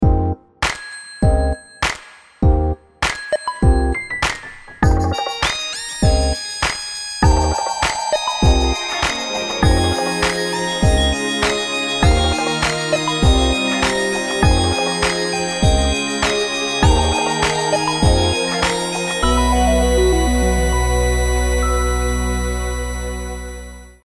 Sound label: Urban Beat, alarms; SU370 Optimus One; LG